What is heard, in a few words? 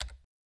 type; NotDotTeam